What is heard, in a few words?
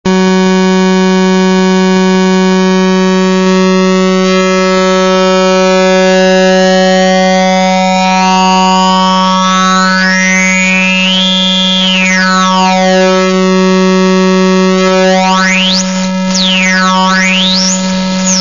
pulser